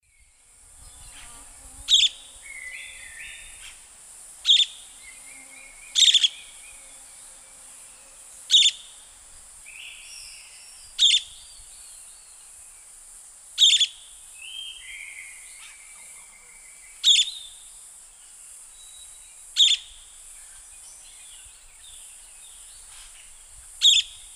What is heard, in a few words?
Megarynchus pitangua, call; S. America; Eastern Lowlands